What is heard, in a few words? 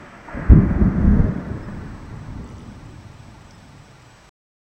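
high rumble distant thunder; disaster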